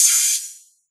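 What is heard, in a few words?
HI HAT, 160 BPM; Ueberschall; Drum & Bass Flash Trackz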